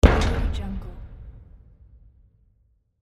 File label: giant robot footstep